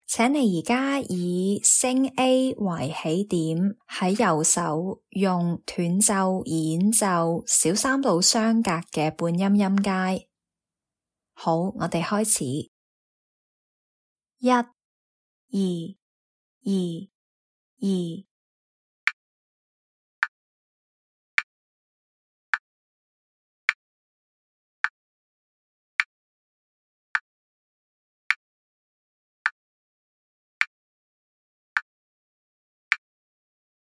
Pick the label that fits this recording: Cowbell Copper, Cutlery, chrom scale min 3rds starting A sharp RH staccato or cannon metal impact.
chrom scale min 3rds starting A sharp RH staccato